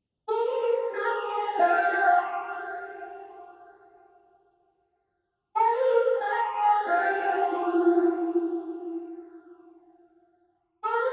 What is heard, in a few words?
Vocal Loop - 91 BPM F Min; On My Way; Cymatics; Infinity Vocal Collection